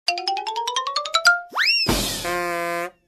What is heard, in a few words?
Comedy Glockenspiel with drum